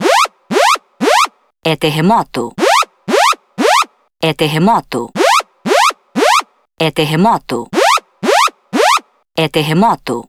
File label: cbs translated earthquake; iOS 26 Sounds